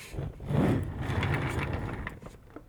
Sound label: Chair Roll; Micro Pack